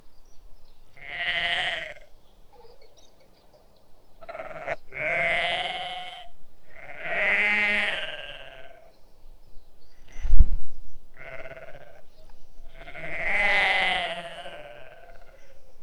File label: sheep baaaaaa sound